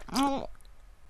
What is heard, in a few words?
Moan